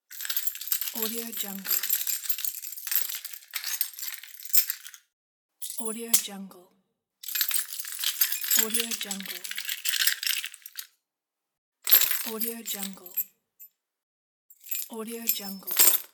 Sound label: keys